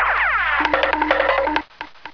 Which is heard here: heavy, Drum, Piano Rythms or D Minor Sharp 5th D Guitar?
Drum